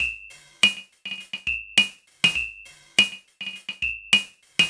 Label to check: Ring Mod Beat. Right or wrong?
Right